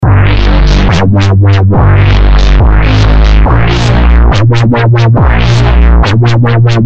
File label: Bass